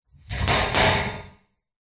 foley metal bars object run across rattle clunk short